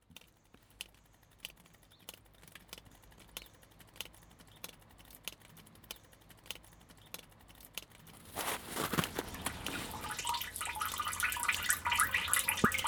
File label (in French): mobilier d egout; winterschool